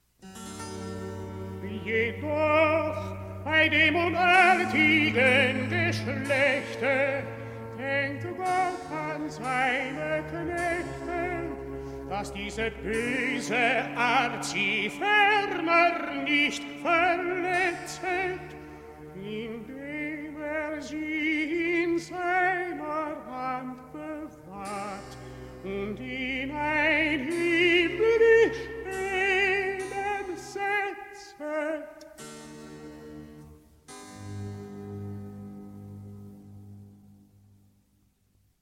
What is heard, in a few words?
Cantata BWV 70 Recitative, tenor